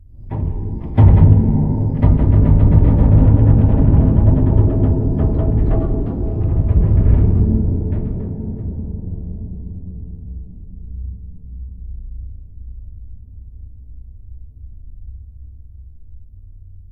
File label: rand bridge groan, ambient; Left 4 Dead 2; Source Engine